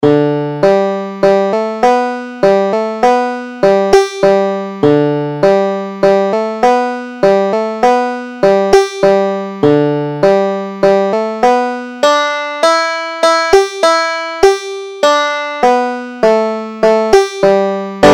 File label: big scioty, banjo